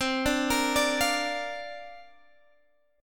C 7th Suspended 2nd Suspended 4th, C, down, Guitar, Standard; C7sus2sus4 arpeggiated